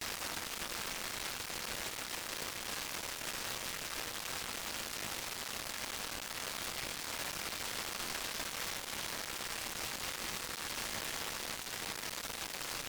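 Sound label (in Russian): цифровой шум и треск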